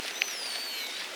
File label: pulse